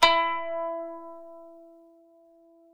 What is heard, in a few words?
KPES E4, KANUN; SoundBytes; Early Patches; KANUN SMAL